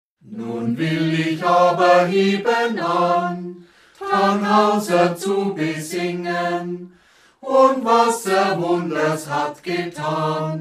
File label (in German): Balladen Moritaten; Tannhauser